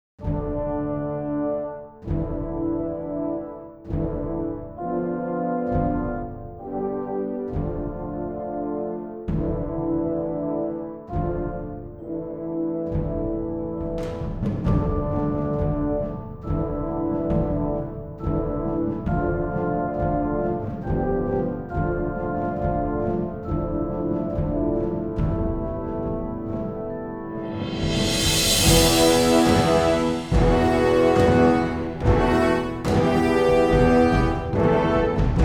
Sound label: Sudden Defeat, Orchestral